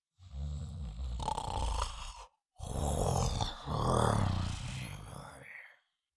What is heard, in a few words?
Cartoon lion voice snore snoring